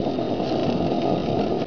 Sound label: Scrape; XBOX; IHRA Drag Racing 2004